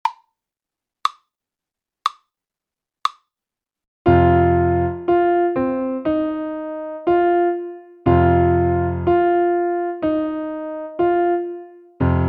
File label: Rock n Roll slow